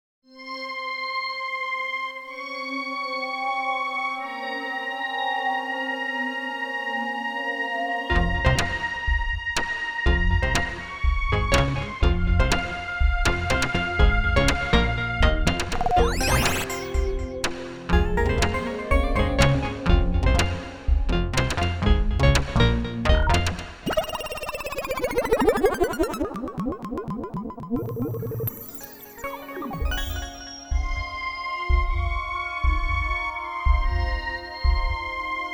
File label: Synth